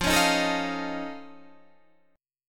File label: F Augmented 7th F Guitar Standard, chord; 13,16,13,14,16,13; strummed; capo=3